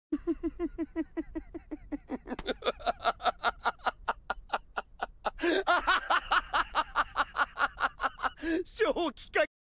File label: psycho laugh